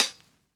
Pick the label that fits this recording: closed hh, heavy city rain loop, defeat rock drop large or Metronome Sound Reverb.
closed hh